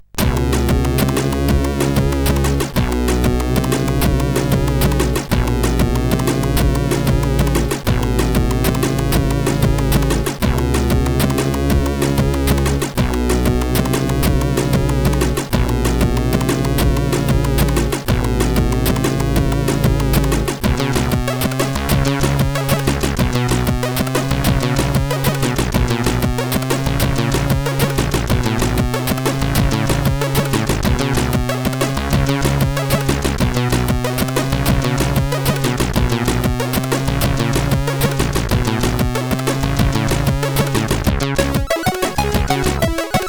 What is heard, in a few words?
Save Jingle; sid; CSG8580R5; Imploder